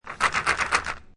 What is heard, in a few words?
Billiard Pool Snooker Rack Up Balls In Frame; Sports